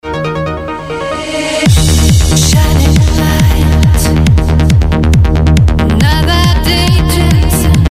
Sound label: 4 strings